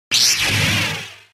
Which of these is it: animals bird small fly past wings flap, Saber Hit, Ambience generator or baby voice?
Saber Hit